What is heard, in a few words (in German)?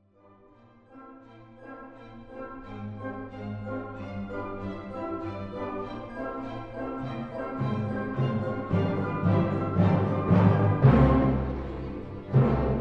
Beethoven 5te Sinfonie 3ter und 4ter Satz Allegro